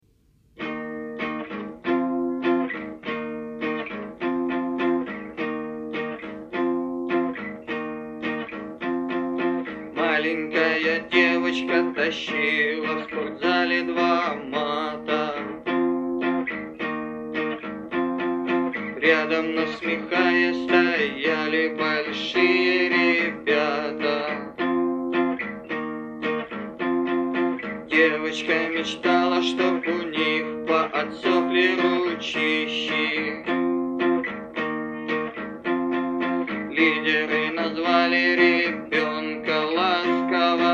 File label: unplugged